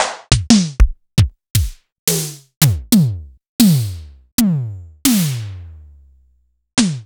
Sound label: Simmons SDS5 kits